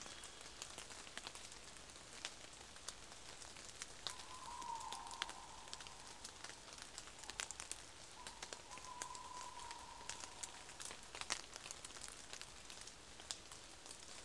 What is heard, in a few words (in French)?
foret pluie chouette; foret de crecy